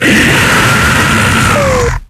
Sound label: FleshPound normaly; zombie plague